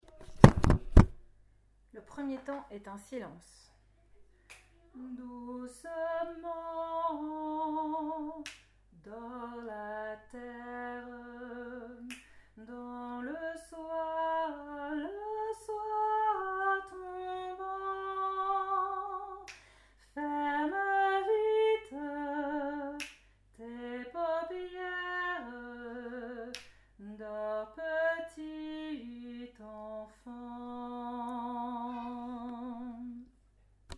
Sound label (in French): Berceuse cosaque alto